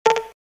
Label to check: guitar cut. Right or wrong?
Right